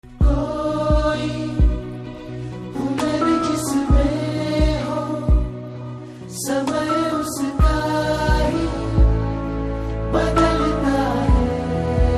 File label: Ringtone